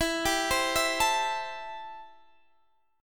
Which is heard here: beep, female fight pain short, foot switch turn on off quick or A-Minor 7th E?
A-Minor 7th E